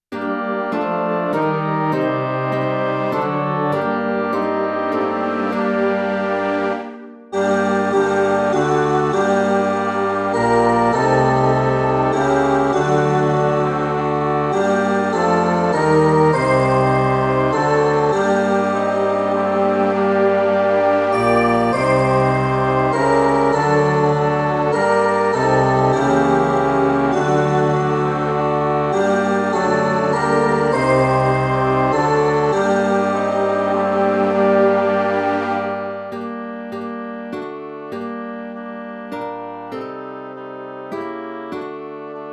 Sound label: midi